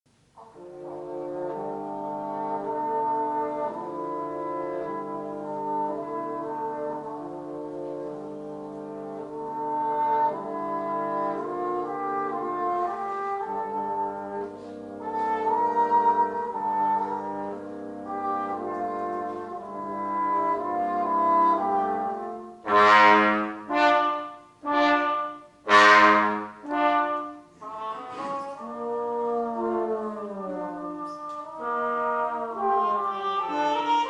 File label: Valse melancolique, Brass